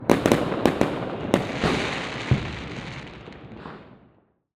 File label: FIREWORKS; Bombay Sonic